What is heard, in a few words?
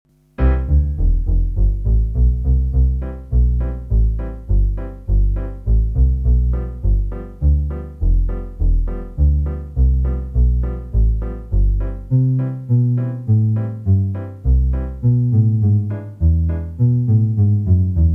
Bas Patterns, accordion; Midi Partner MP1